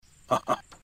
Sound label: haha, old man laugh; zelda botw